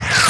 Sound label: combat atmosphere; World of Tanks